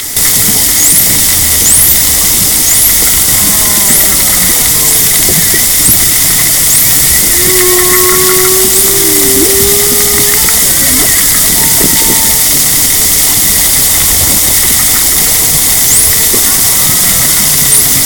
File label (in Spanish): Delfines Jardin